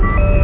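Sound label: button sound; drwho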